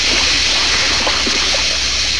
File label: fuse; gunslinger